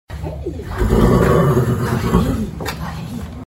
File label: horse-human